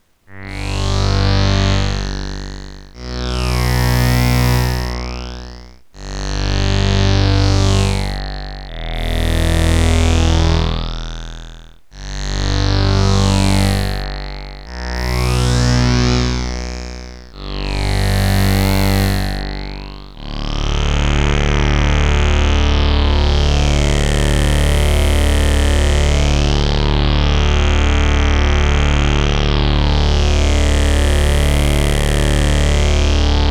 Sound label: E-mu Dark & Slow